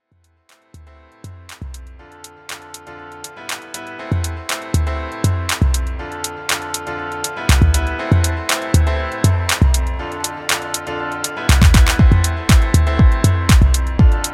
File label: sad piano